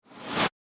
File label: Pop Up Menu; Daily Quest; DailyChallengesPopup